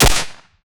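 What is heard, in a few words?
usp suppressed single shot; shooter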